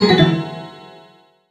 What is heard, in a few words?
battle announce bad; Hello Kitty and Friends Freeze Tag Party